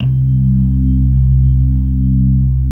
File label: HAMMOND; Jazzistic